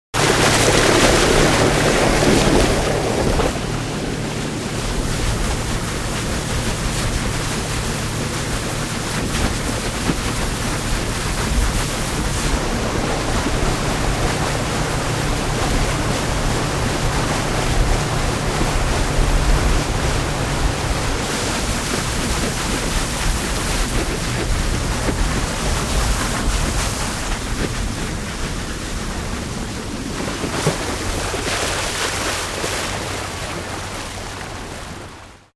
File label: Boat Water Taxi Moving High speed, Small water taxi; Boats (Sound Effects:Vehicles)